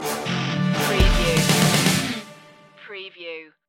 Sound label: pump action stinger